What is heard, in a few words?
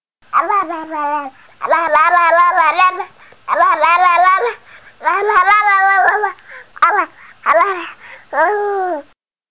noise; Making Noises